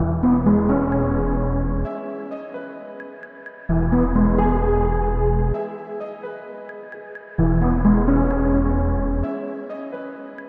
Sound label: Melody Loop 130 BPM E Maj; Humble Love Melody Loop; Cymatics - Humble Hip Hop Sample Pack